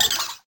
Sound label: bats